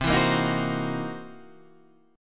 C 7th Sharp 9th Flat 5th, C, down, Guitar, Standard; strummed; JGuitar